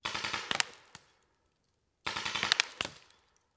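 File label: Paintball Gun Impact; Sound Ideas; Guns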